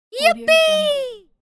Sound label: girl cheering